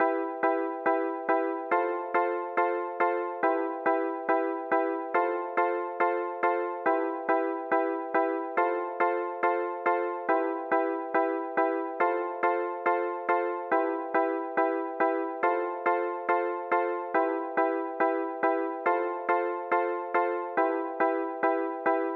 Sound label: 140 BPM E Min Juno-60 Keys; Synth; Loops; Loop Stems; Cymatics; Fantasy Synth Sample Pack; Heat